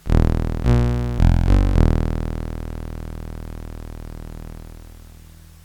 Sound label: Neutron OSC1